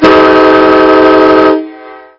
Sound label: horn